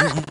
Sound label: Grunt; Lewd SFX